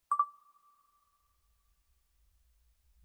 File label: click